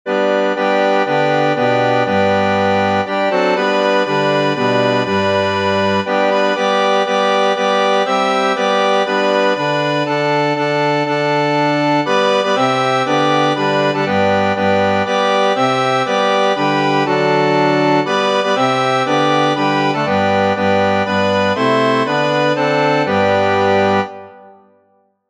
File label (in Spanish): te loamos oh dios, himnos cristianos